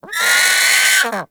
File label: Sea Bird Vocal Alerted; Characters; Warframe; Ostron; Hunting Critters